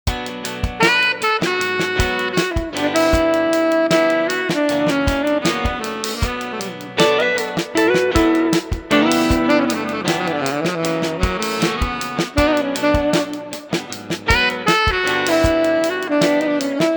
Chilled Sax and Guitar

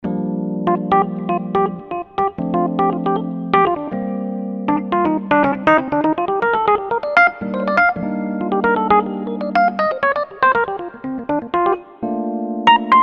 Jazz Keys

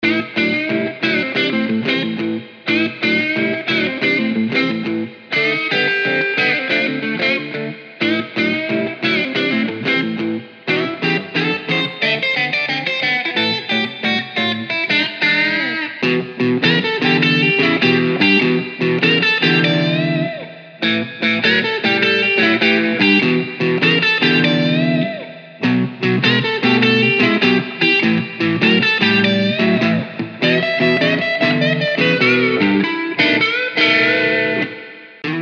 Bluesy Guitars

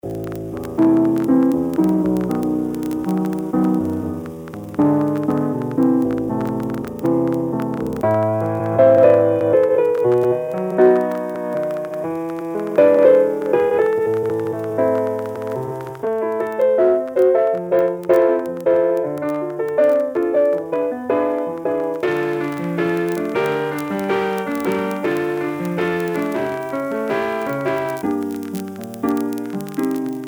Lo Fi Latin Keys

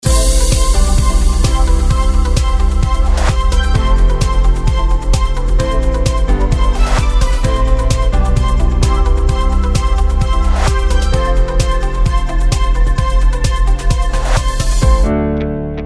Upbeat Pop

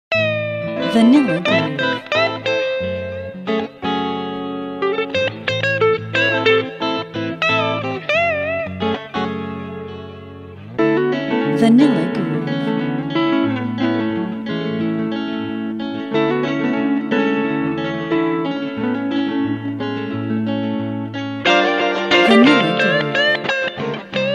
Smooth Electric Guitars

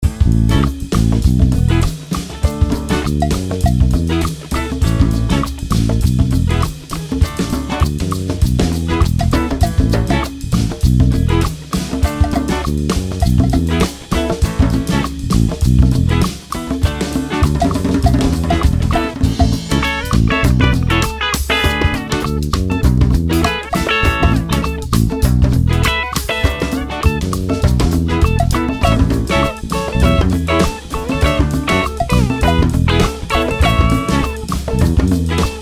West African Highlife Ensemble

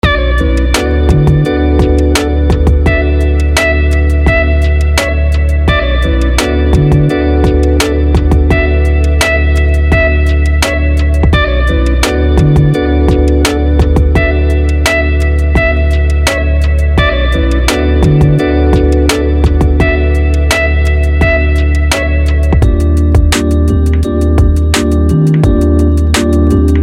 Lo-Fi Tones

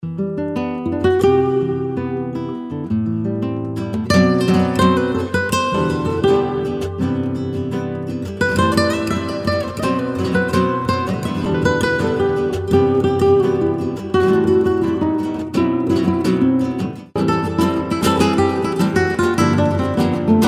Authentic Flamenco Guitars

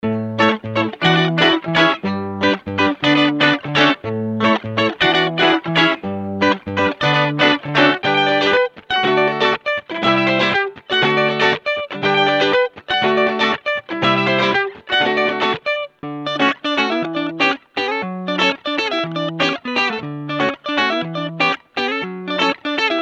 African Guitars

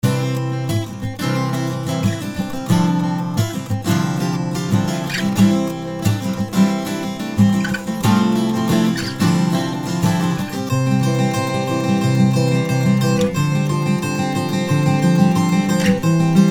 Acoustic Inspirations

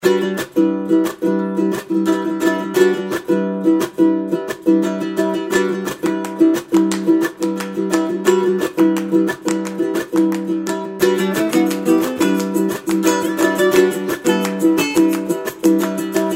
Baritone Ukulele

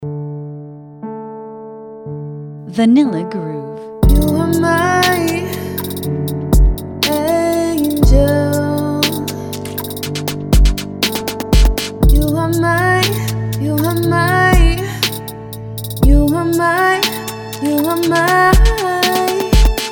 Silky Smooth Vocals

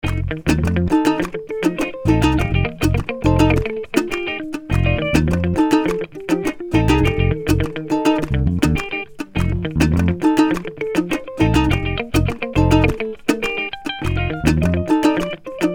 Latin Guitar Music

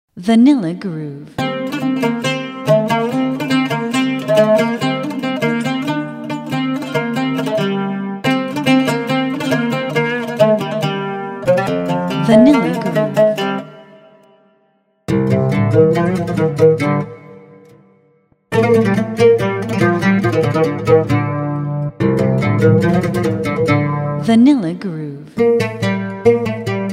Sombre Oud

